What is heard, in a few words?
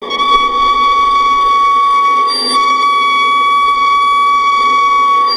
Violin Extended Technique